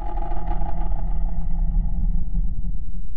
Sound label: bomb